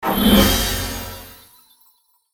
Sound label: pure heal; cstrike; war3source; omniknight